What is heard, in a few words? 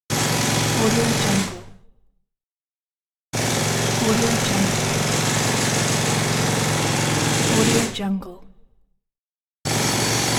jackhammer; moonaudioproduction